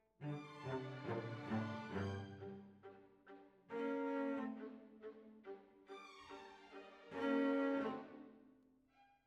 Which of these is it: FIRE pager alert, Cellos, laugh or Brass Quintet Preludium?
Cellos